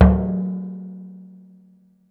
Oil Drum

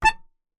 Melodica Short Note

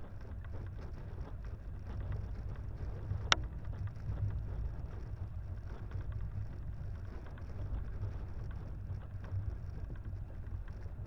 Sailing

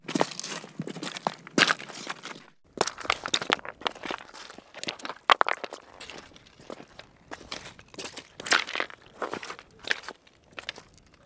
Wet Sand And Pebbles, Beach

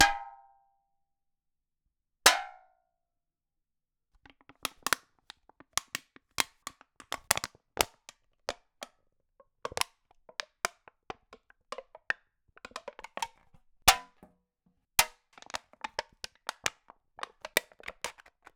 Beer Tin, Drinks Can

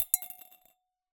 Drop In Glass, Medium Wine Glass

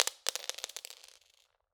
Drop On Floor, Medium Wine Glass

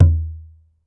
Kendang, Gamelan

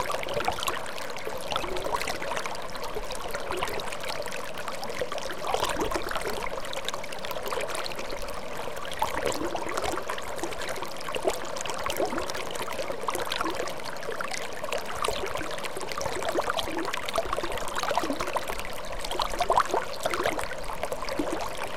River, Static Microphone

